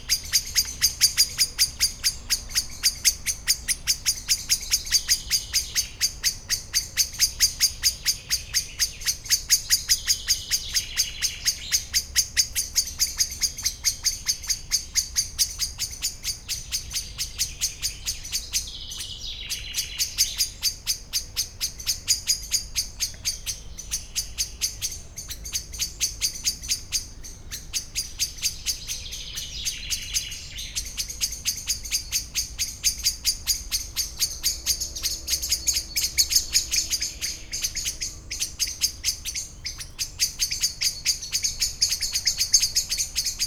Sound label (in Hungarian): riasztóhangja, fekete rigó; szegedi zoo 2011